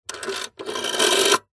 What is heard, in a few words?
household telephone old fashioned rotary style recorded underside dial no three